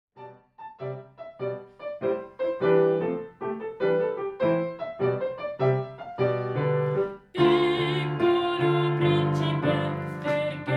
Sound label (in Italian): CORO DEL PUBBLICO - Lo stormo di uccelli 1° intervento